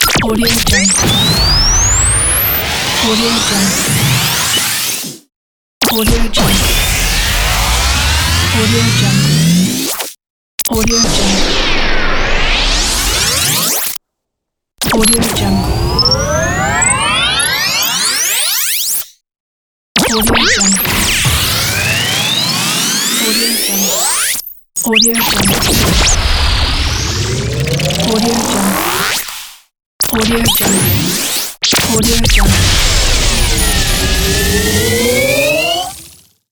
imaging radio effects

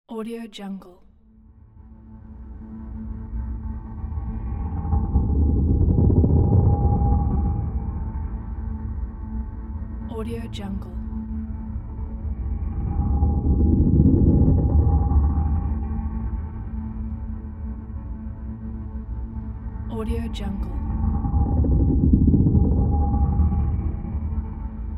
aliens

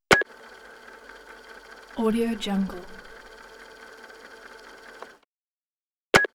answering machine movement; hollywoodedge

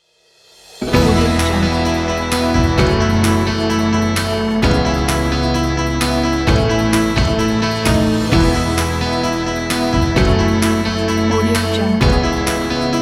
active day

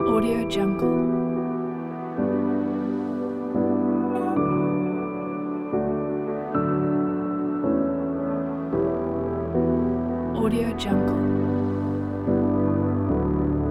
background presentation corporate kit

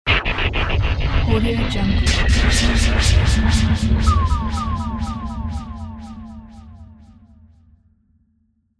retro sci fi

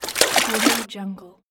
canoe paddling

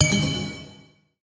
ambient game ui notification positive pluck; esmteam

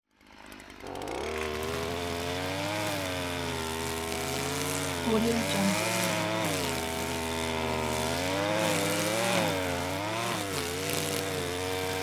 trimming grass around the fence

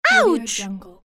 female yelling ouch

